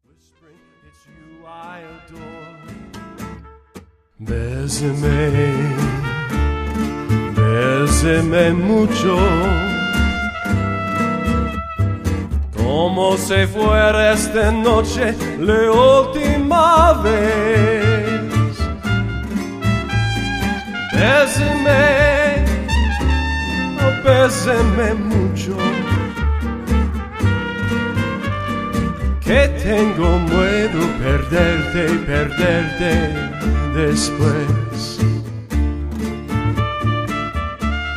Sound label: Besame in Spanish